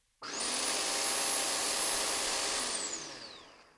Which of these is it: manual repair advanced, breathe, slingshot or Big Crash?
manual repair advanced